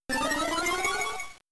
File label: Stat Rise Up